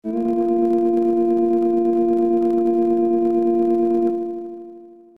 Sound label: train horn; ff3; ff3us